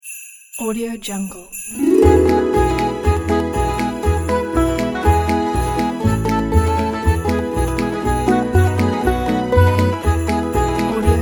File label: christmas jingle bells